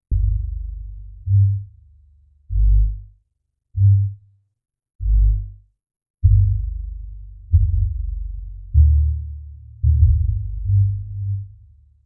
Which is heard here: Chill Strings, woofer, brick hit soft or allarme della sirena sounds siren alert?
woofer